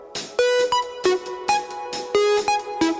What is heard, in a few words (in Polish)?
alarm cykliczny; alternative